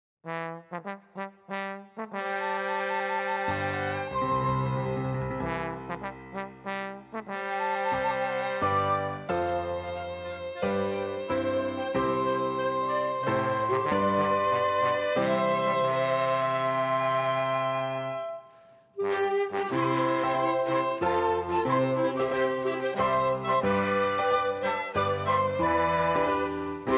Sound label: instrumental; Himne de Ripollet